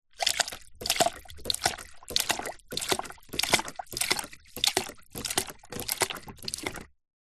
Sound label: industrial pump siphon hand plastic squeeze squirt